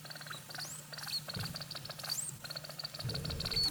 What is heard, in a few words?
mothering, degu